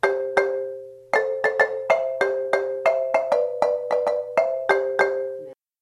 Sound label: lead interlude, marimba; chords